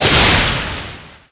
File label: Collapse; RPG Maker; mkxp-z; RTP; RPGVXAce